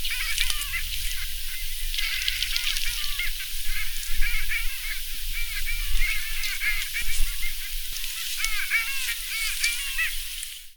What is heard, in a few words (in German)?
eisente